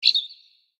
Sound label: voice bird; maoudamashii